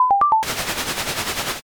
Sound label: fax